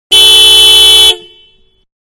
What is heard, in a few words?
Car Small-Horn-Medium, Exterior; Cars (Sound Effects:Vehicles); Manhattan Production Music